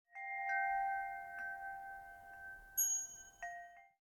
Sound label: Ambience Scatter Chimes; Josh Journey Darkness Totems; Santuario